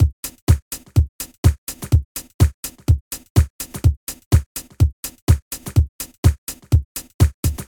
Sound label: House 125 Pitched Up